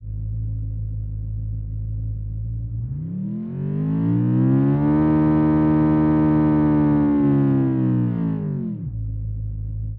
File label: v8 german sport, engine sound